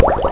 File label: bubbles